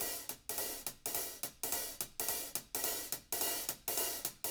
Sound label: HIHAT LOOPS; Living Drums